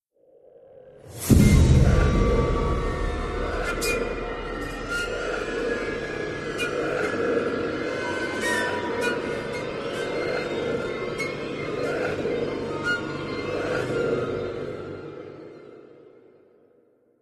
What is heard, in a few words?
Creepy; Dark Void; ps3; xbox 360